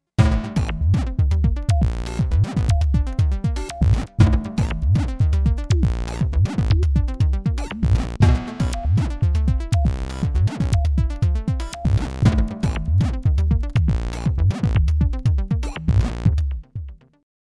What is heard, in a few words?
Note Jam; AX Edge